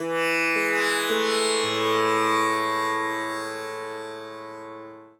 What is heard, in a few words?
Tambura